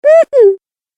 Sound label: Cartoon Game Voice "Mm Mm"; Cartoon Voice Sound Effects